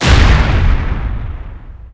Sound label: door; PC World Komputer 1998 May; rrdemo